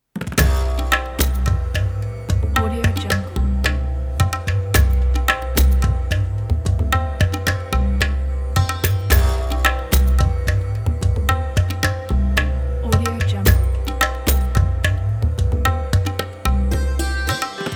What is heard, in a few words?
india drums